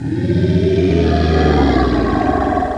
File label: GROWL; duke nukem total meltdown